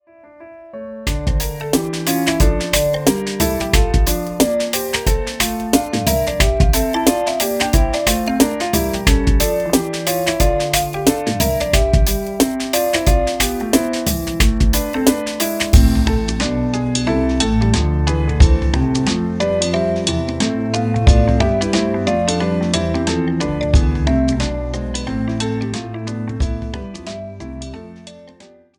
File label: fugue d minor; bachs; bwv 538